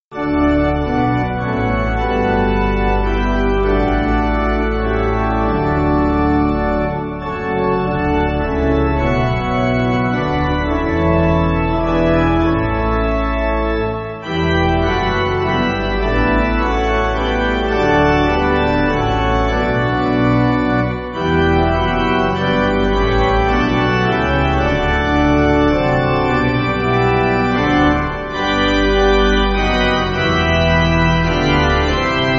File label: Pipe Organ, Music Backing; Holy Faith